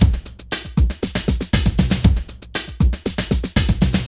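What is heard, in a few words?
drum loops, right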